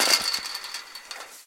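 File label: rattling from hitting a metal mesh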